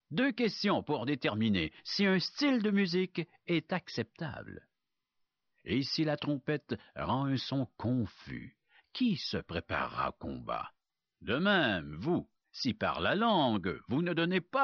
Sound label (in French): trompette son confus